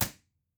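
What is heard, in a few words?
Live Room impulse response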